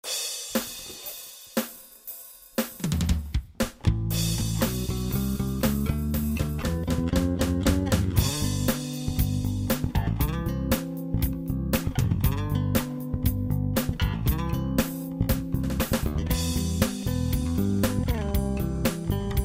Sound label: Finger Style; gadow basses; rocket music